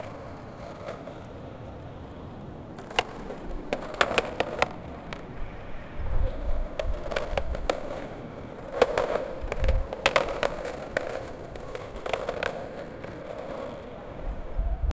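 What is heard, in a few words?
skateboarding in a straight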